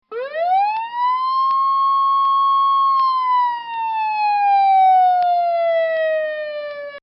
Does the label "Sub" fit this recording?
No